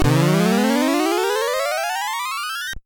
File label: Commando Alert; ringtones